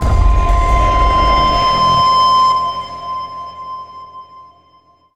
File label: Overlay Combat; battlefield hardline; ps3 ps4 windows xbox 360 xbox one